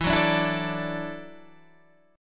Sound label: E 7th Suspended 2nd Suspended 4th, Guitar, Standard; E7sus2sus4 strummed; 12,9,12,9,10,10; JGuitar